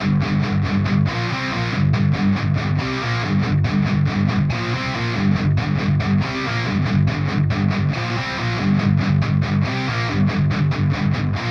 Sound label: git ref